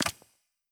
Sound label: reel Bounce; netent